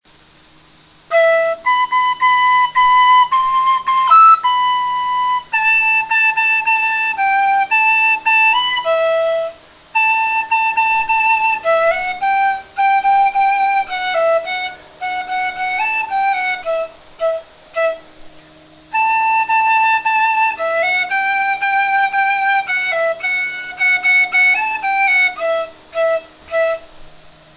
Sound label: dizi